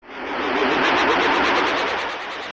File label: Reveal; Phantom Ganon; OOT